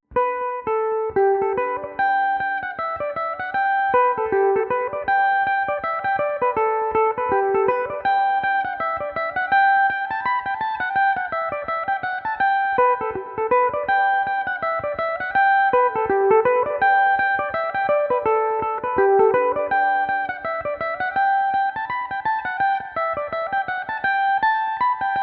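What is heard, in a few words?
G major belmuse, irish mandolin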